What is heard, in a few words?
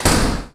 close door